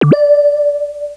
open; Quicken

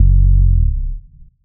Bass Slide F1

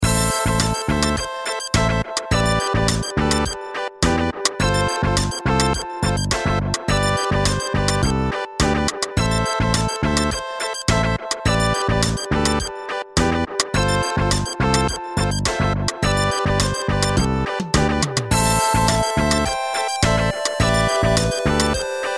Music Reploid Base; Megaman Flash

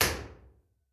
Real Places Fox Caves R; Impulse Responses; Ableton Live 12 Lite; Hybrid

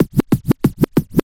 Scratch; Ableton Live 12 Lite; Core Library

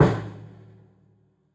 Tom Dirty; Drums

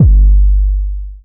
Kick, Drums; One Shots; Cyanide

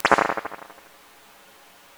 Springs Overdrive Spring, ImpulseResponses; Ableton Live 12 Lite; Hybrid

